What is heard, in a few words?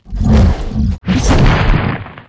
Attack; Eldcrochi; Mob